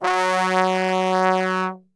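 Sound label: F#2 bass trombone, Brass; Best Service; Brass Super Section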